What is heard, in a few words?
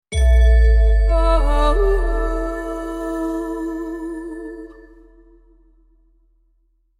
logo